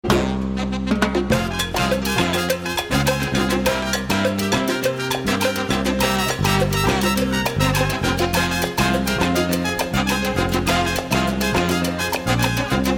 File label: salsa